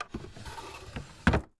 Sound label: Drawer Close; Haunted Bloodlines; SFX Producer